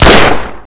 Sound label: beretta shoot, weapons